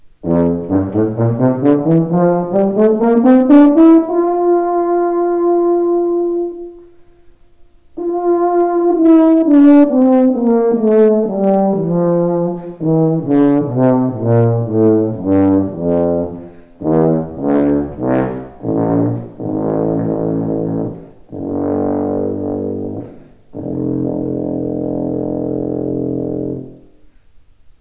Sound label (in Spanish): TUBA